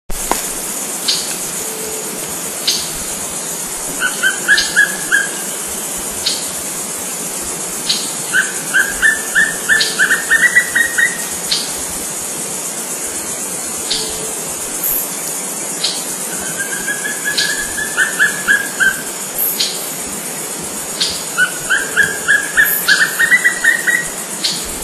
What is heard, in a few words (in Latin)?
Piprites chloris